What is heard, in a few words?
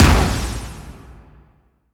Impact Layered